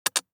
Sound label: DICE on Glass, Drop, Standard, 3 Three Dice; Samples; ArticulatedSounds; DICE Sfx Party (Extended)